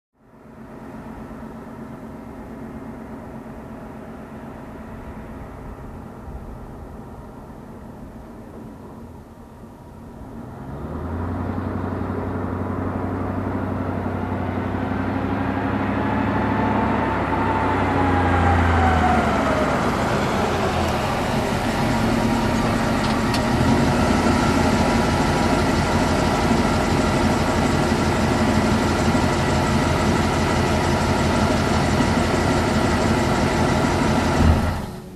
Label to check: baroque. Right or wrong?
Wrong